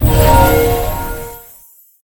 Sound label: UI ACHIEVEMENT UNLOCKED; Dungeon Defenders Eternity